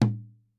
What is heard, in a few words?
Tom Acoustic Room